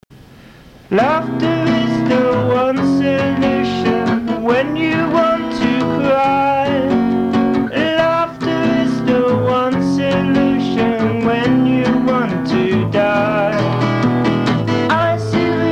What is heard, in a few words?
laughter